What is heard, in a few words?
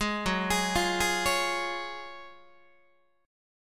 Gb Major 7th Suspended 2nd, Guitar; GbM7sus2 arpeggiated; JGuitar